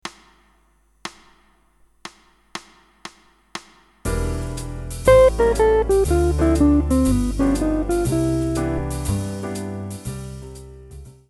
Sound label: guitar scale exercise example